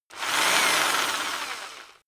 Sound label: rock drill rev high medium; maschinen; bohrer; soundpool